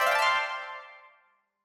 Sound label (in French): activite terminee